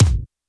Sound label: kick, Roland R-70, BD